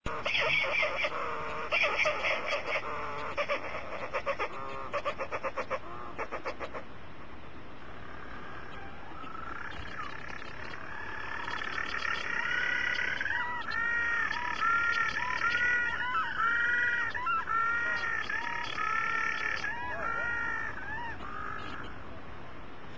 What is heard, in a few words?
Mutton Bird; oceania; australia